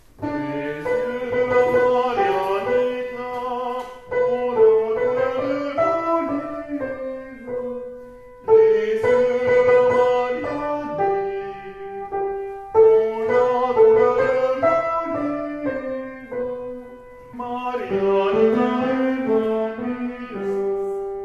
Yeux de la Marianita Tenors

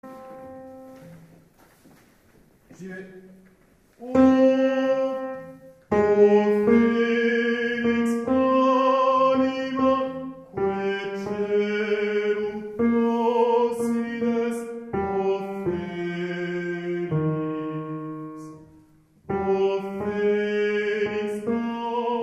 Hommes; O felix anima

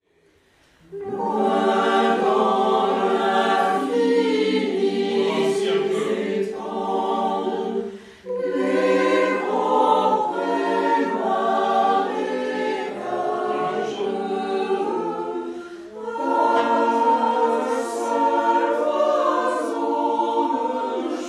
Chant des Marais Tutti

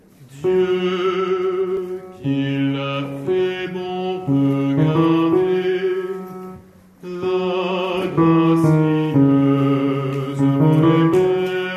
Dieu qu'il la fait bon regarder Basses

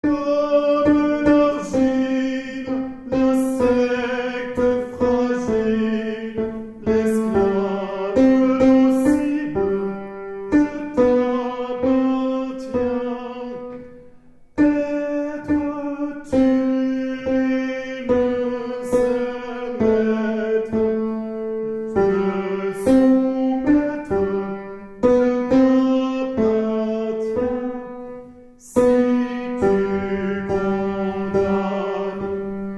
Tenors

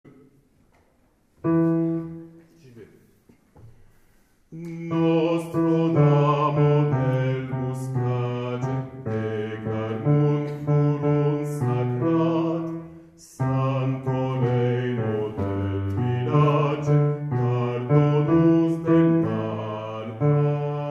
Nostra Dama del Bocatge Hommes

